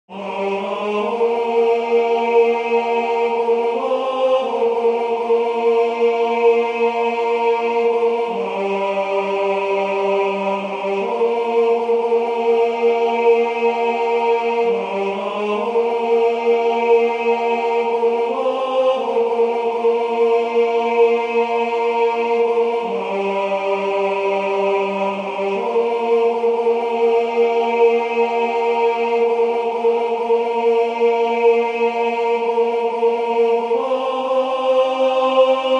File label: SATB Tenor; Einzelstimmen